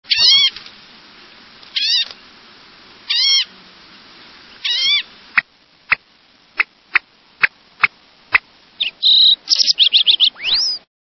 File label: Green tailed Towhee